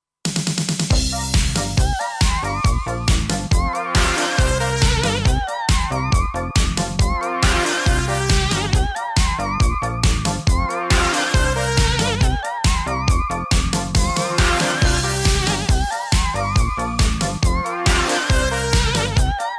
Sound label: Sounds of Soul; Steinberg Absolute 5